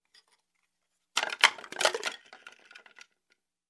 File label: Wooden pieces rattle